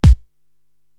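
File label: bass drum Beef, Korg TR-Rack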